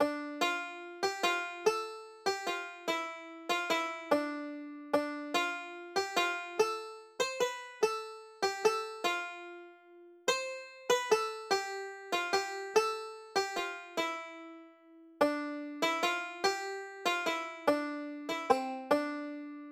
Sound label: medieval loop